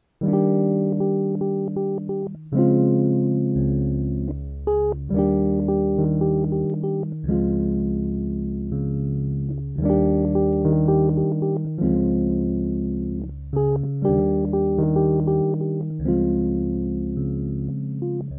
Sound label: Pianet